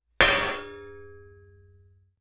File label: Metal; TOOLKIT vol.2